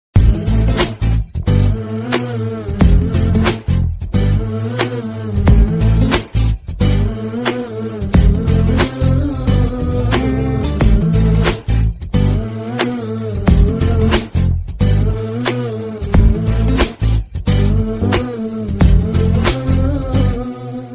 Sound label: love bgm ringtone south indian bgm; tamil ringtone; famous tamil bgm tone